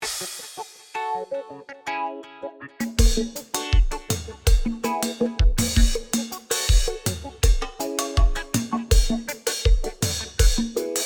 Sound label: Drum; Silex